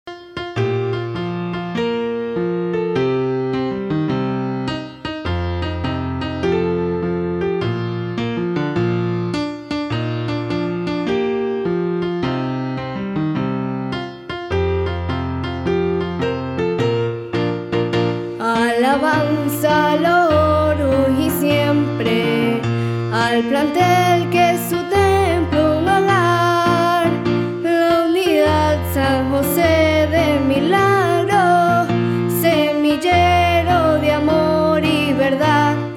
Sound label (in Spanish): himno